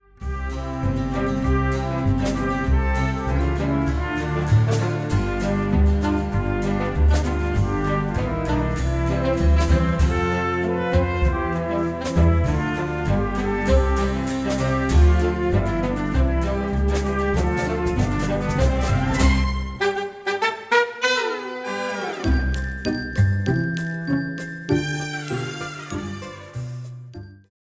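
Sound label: instrumental version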